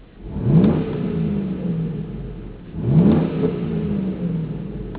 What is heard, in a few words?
interior revs; Corsa